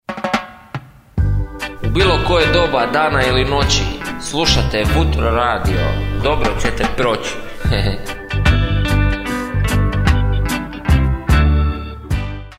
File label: jingle